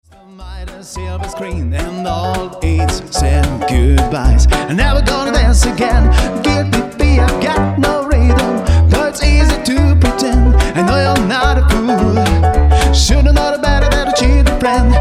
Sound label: swing